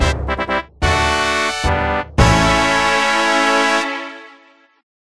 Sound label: Herblore level up